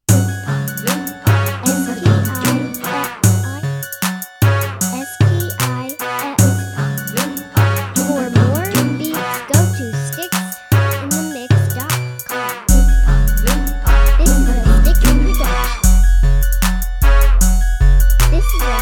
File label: oompa